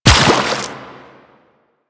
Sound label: Run Water, footsteps; Warframe; Quests; The New War; Kahl